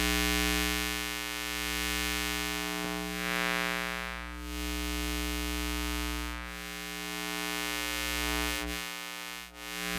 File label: Electromagnetic Fields